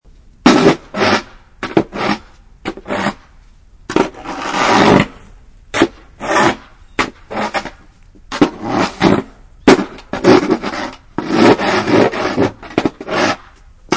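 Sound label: Scraping Snow Sidewalk, Loud intense scrapping of shovel on icey sidewalk.; Scrapping Ice Off Sidewalk; Yard Tools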